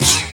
FX Dance

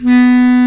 WND BS CLAR, Bs Clarinet, Orchestral Winds

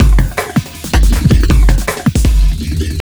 JUNGL 160, JUNGLE LOOP

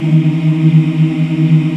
VOX AFMM, VOX Afro Mms, Africa; Roland LCDP11 Africa VOL-1

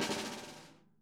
SNARE, Interactive Drum Kits

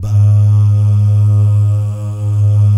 BAH GUY, Vocal Planet Jazz & FX; ILIO; BAHS GUYS

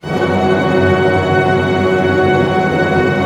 TREM F# FF, ORCH TREM; Sound On Sound - Peter Siedleczek's Orchestra

